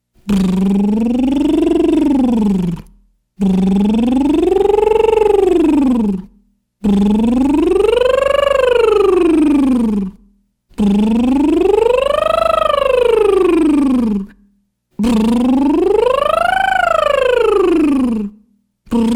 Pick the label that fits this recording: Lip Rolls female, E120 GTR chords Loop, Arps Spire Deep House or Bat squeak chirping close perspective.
Lip Rolls female